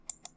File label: click mouse